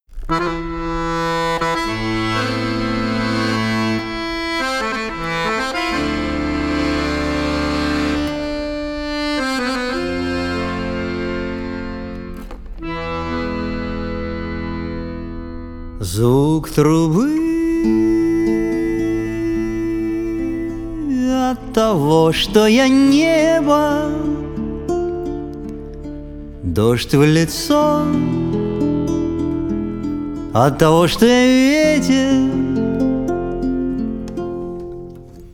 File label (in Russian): Zvuk Trubi